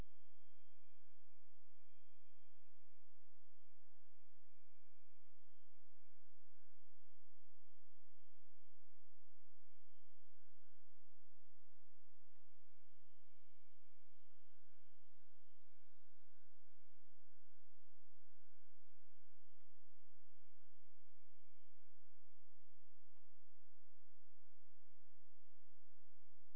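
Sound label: hydrophone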